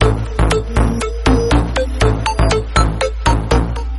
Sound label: dice roll music